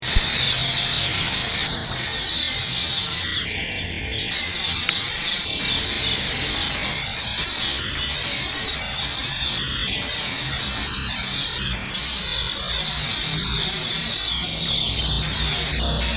suara burung pelci rombongan tanpa skylark

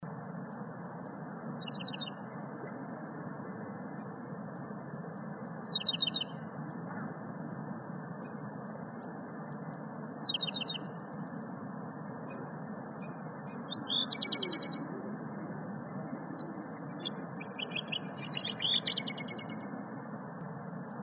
suara betina branjangan

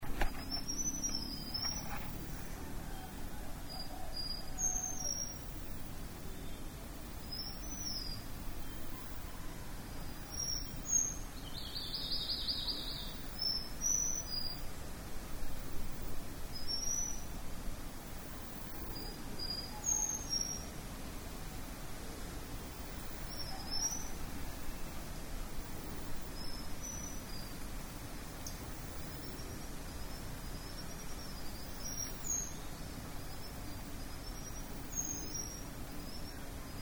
sikatan lompobatang

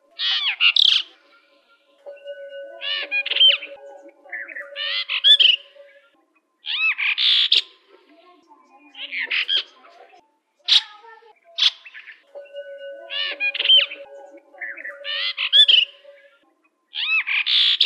jalak brahmini

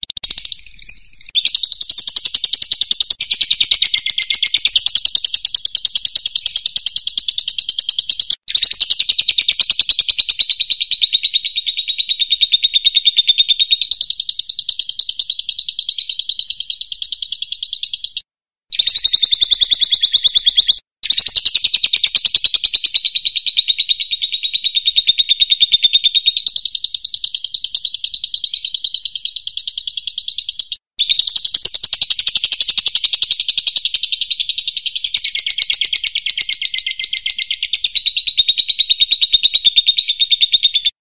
masteran dahsyat lovebird

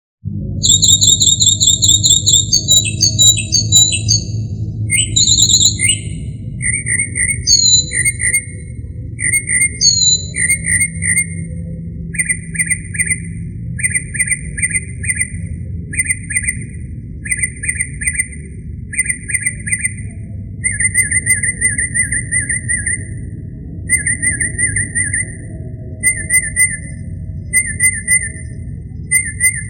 kicauan burung northern mockingbird

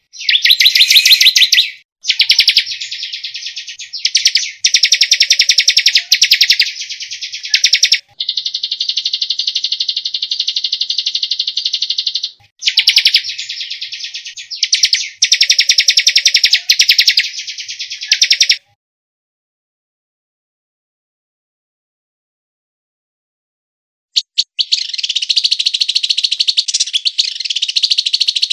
kombinasi cb kt cj

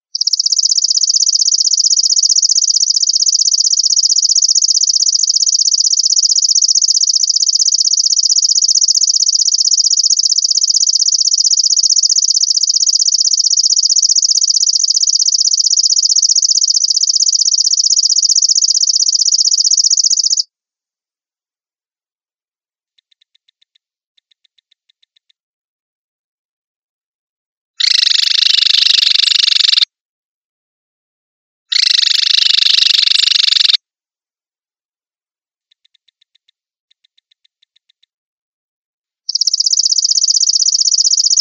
suara serangga malam

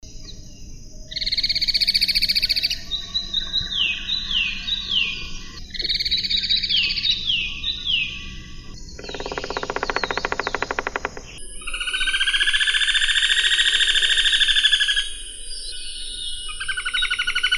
caladi batu

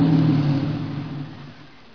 suara mobil

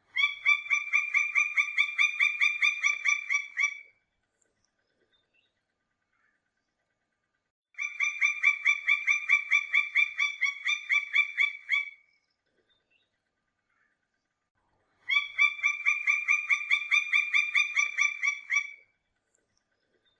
suara pelatuk ayam

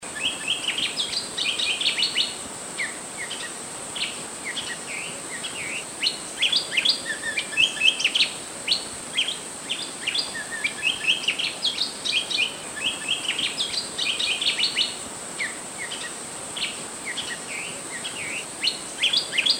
kipasan bukit

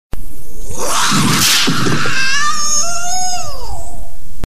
ringtones lucu ringtone gokil ringtone aneh

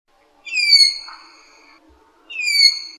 kepodang dada merah

